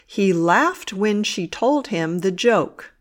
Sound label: laugh sentence